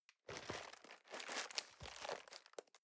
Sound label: cardboard box medium turn soft; objekte